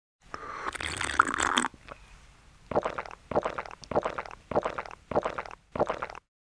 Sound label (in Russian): звук звуки глотков воды питье